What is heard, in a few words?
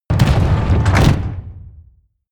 mechanical lever wood large down